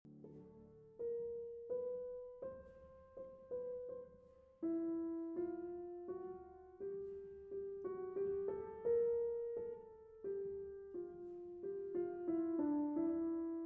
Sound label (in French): Fugue en Ré Mineur; BWV 903